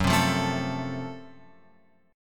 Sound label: F sharp Minor Major 7th Flat 5th F sharp; F#mM7b5 Chord {2 3 3 2 X 5} strummed